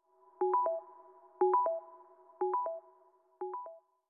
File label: calm alarm